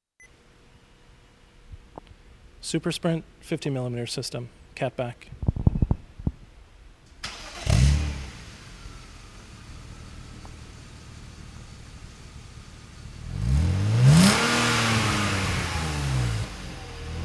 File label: Supersprint 2-in., black; EuropeanCarWeb